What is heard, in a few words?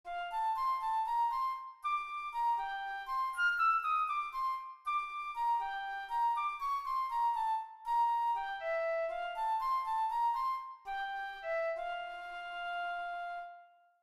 flute